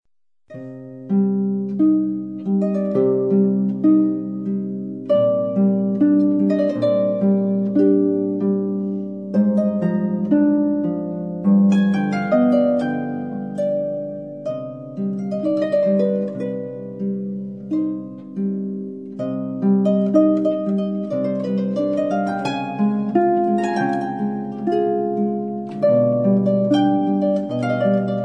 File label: Solo, harp; classical; Andrews: Come Ye Sons Of Art